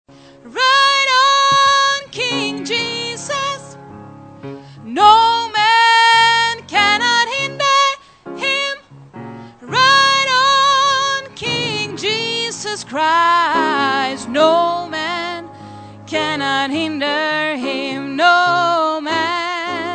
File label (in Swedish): sopran